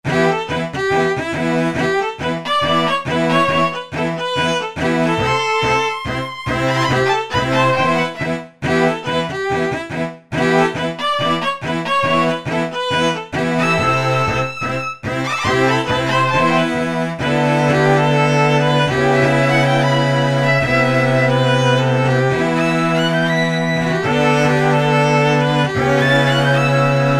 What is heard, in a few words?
Solo Quartet, E-mu Proteus VX